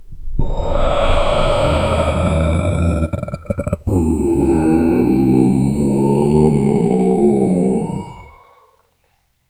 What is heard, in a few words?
a male vampire roars long